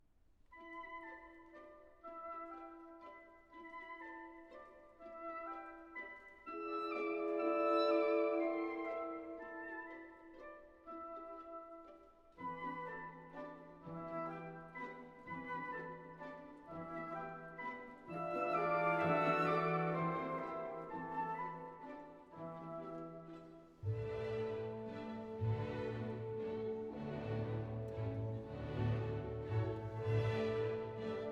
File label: Valses nobles et sentimentales, Version for Orchestra; III. No. 3, Modéré; John Wilson; Ravel Orchestral Works